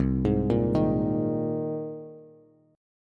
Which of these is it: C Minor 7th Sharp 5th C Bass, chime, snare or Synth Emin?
C Minor 7th Sharp 5th C Bass